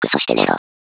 alert

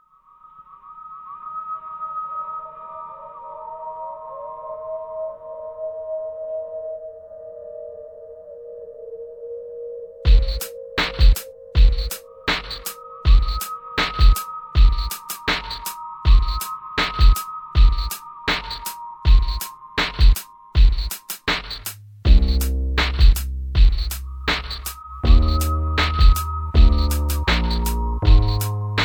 ambience

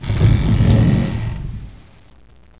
push box

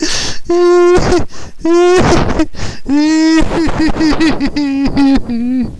laugh

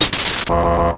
locked button

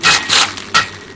upgrade metal

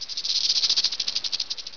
snake idle

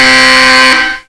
alarm; w00tguy

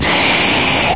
die; gman

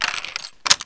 saw belt in, m249

